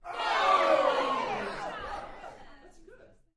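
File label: Crowd 15 Interior Rowdy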